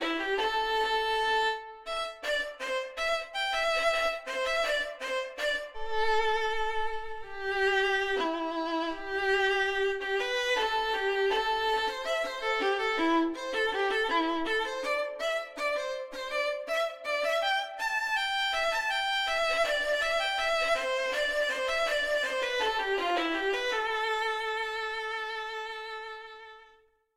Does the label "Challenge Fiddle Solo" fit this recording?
Yes